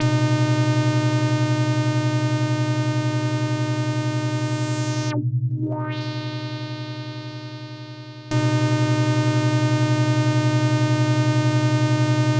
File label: SYNTH